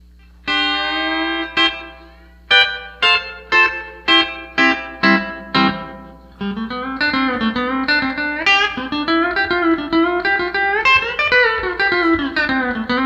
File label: Spring; Hall Of Fame